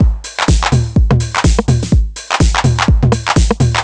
drum niner full 125, Drum Loops, Classic Chicago House